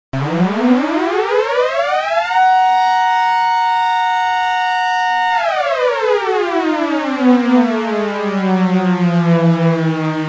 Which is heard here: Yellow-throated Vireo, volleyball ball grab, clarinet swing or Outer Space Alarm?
Outer Space Alarm